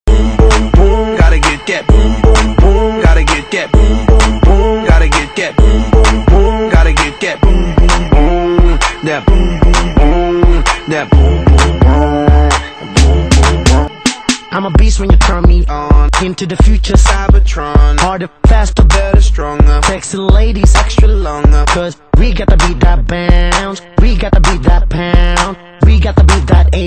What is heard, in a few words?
Boom Boom Ringtone